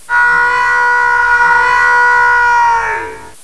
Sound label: aahhh